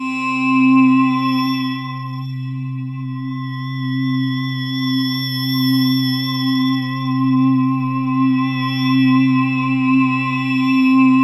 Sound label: BELL DR C3, BELL DRONES; Zero-G Ambient 1